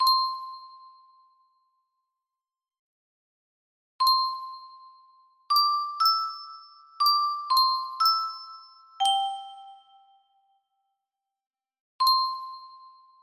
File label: acoustic grand piano